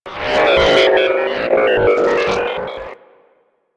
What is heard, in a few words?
Leech General Dialog; Leech Osprey